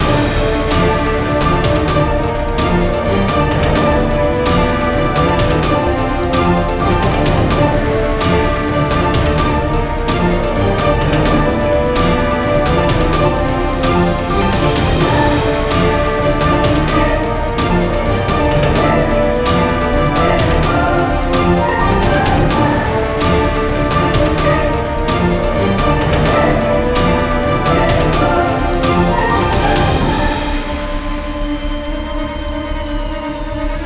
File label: Loop; Lost Epic